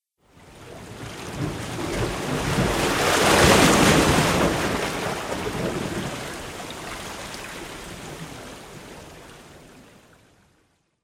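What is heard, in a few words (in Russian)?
волна смывает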